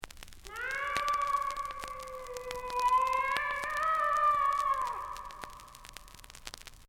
Mean Cat; Halloween; Ghostly Sounds; Power Records

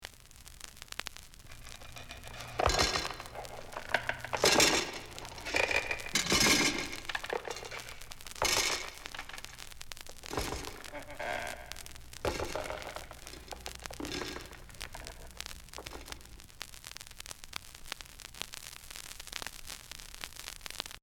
Waking Monster With Chains; Halloween; Ghostly Sounds; Power Records

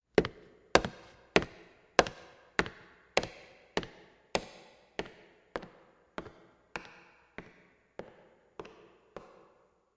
Steps Down Echoing Hall; Halloween; Halloween Sound Effects - Sounds Of Horror

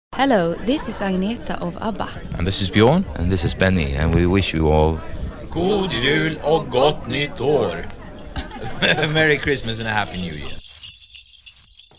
Christmas Jingles

